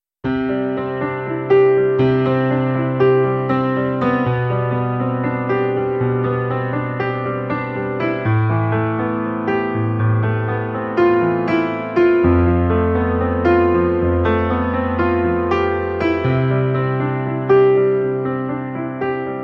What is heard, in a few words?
GRAND IMPERIAL